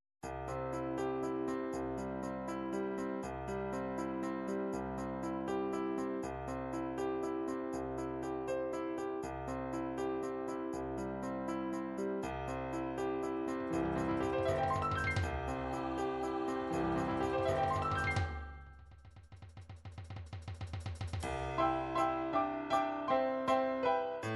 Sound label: ballads ballad, midi